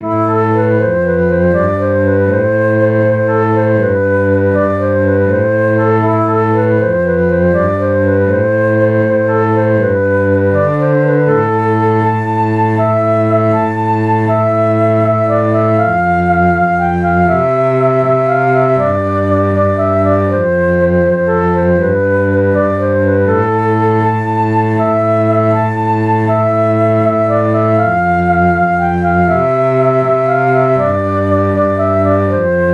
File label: gigue tarentelle